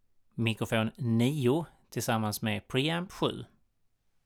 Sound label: mic pre